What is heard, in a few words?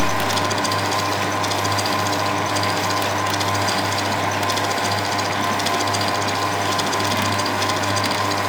Veil Machine Gears Loop; Venus; Warframe; Veilbreaker; Kahl Quest